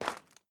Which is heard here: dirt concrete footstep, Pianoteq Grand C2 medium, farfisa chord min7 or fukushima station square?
dirt concrete footstep